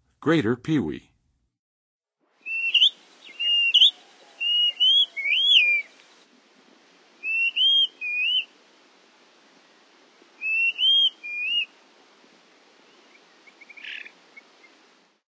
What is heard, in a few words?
Greater Pewee, Songs of Birds; North America; Western USA; Western USA Songbirds